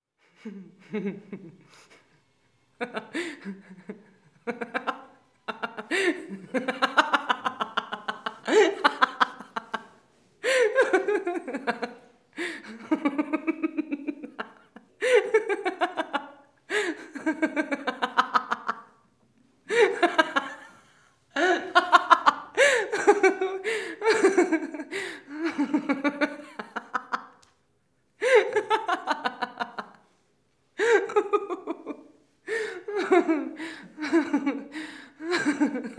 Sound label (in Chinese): 女人笑声; renlei